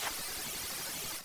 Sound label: Slide Ice